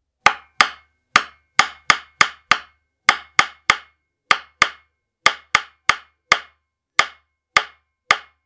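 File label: knocking sound; zvuk stuka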